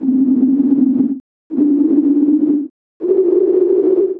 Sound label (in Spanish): bandas estrechas